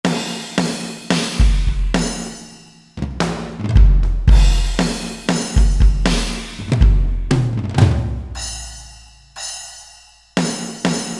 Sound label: Big Rock 2 KIT, Roland SRX-01 Dynamic Drum Kits